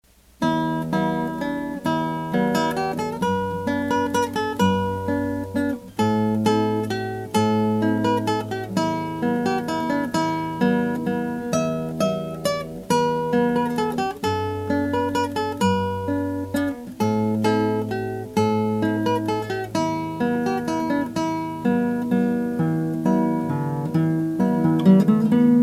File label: cla waltz